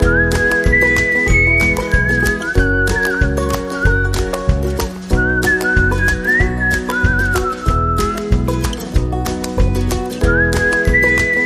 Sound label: Intro Whistling